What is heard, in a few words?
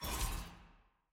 ui hexgate hover